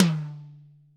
TOM-TOM-L, ACOUSTIC KIT; Greytsounds VOL-1

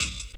RSS, 160 BPM

